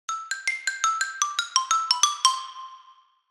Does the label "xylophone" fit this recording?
Yes